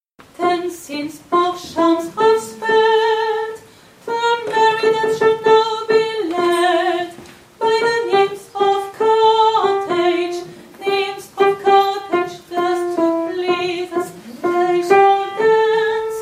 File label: alto